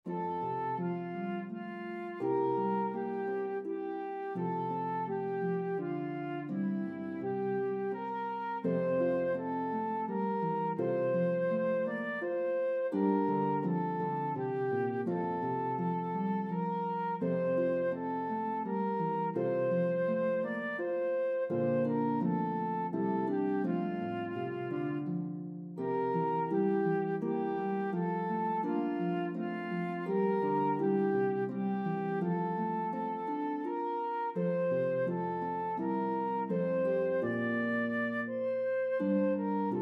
Flute Harp, French Lullaby; Duet for Flute & Harp; This French Lullaby is perfect for setting a calm, meditative ambiance.; Infant So Gentle

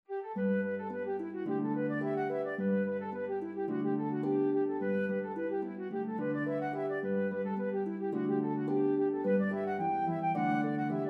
Flute Harp, Duet for Flute & Harp, reel; This reel is charming as a Wedding Recessional or for a Festive Event.

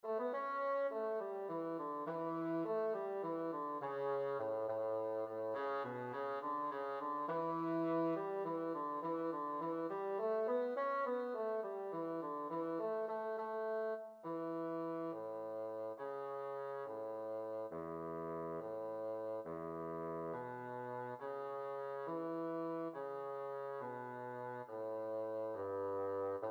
Bassoon Harp, This lively Jig; Banish Misfortune

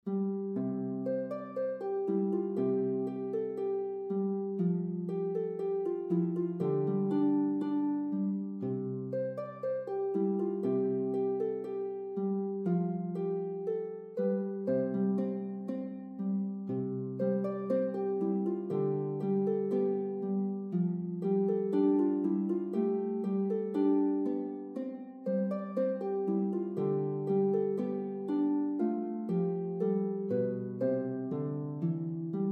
Hiking Tune, Folk Harp

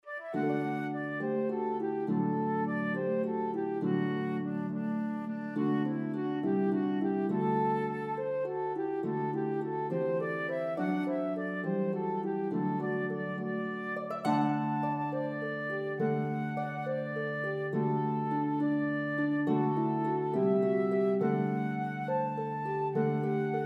Flute Harp, This lively Jig; Banish Misfortune

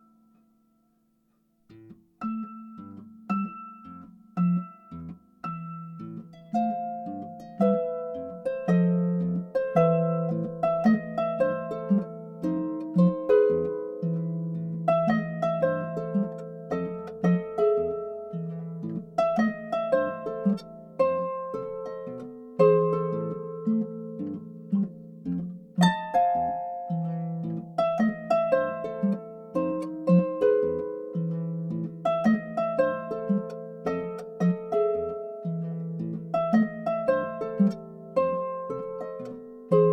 Boogeymans Blues, harp

